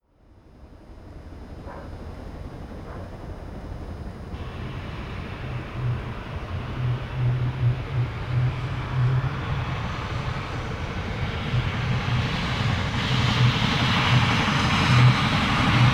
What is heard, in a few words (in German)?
dampflok sound china